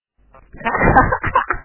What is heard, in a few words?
hahaha